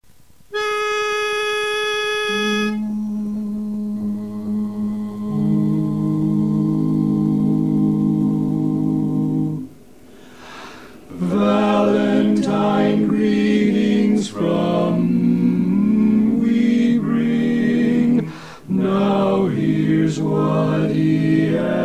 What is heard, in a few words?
BARI Greetings, barbershop quartet